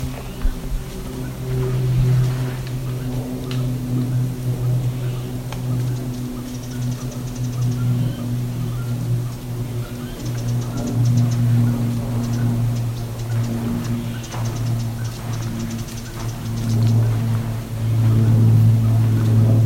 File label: mystery hoots